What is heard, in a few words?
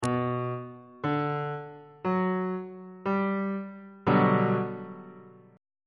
f double sharp major 7th 1st inversion on bass clef